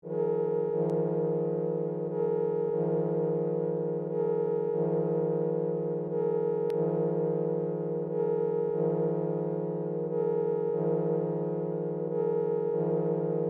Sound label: accordion